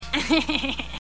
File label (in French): Rire